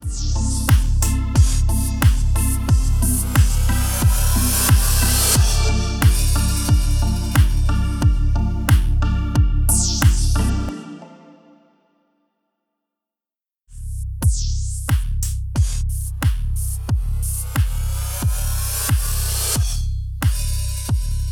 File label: Electronic Beat Instant House Minor; Temperance Pro